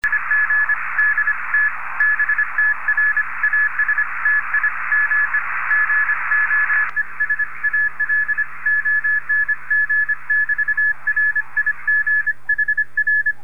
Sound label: 20M CW; ADSP2